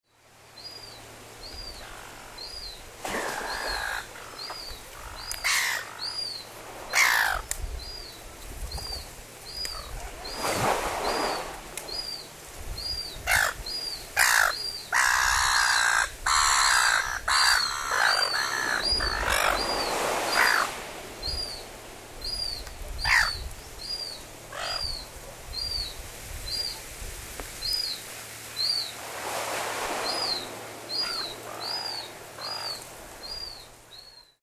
silver gull

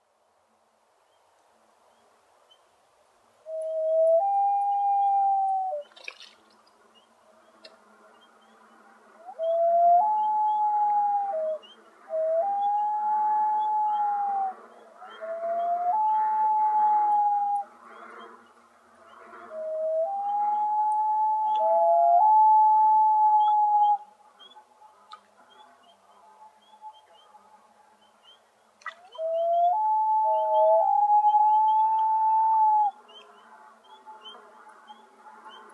common loon

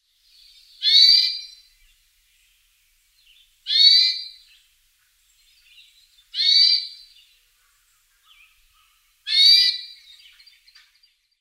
hermit thrush

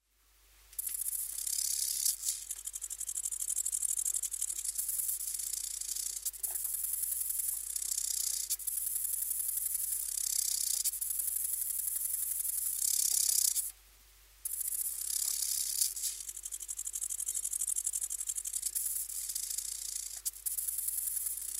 lesser marsh grasshopper

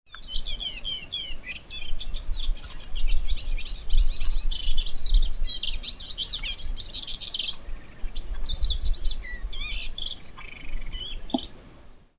paddyfield warbler

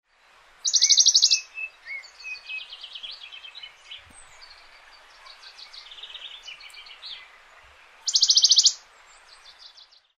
bonellis warbler

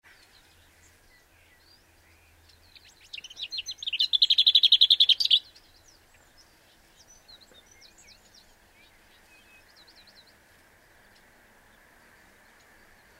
lesser whitethroat

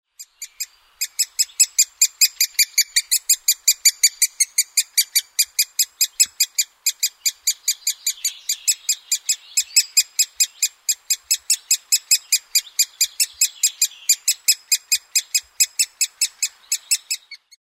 great spotted woodpecker

